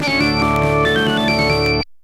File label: Mini Melody, pinball; Gilligan's Island; Bally